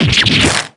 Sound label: damage; auchi